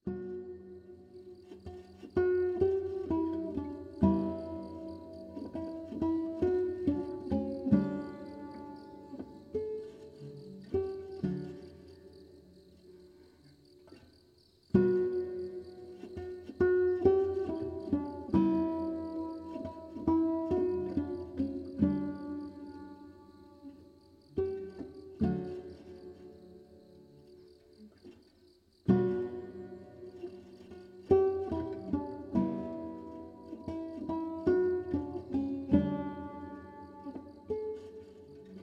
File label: chord a1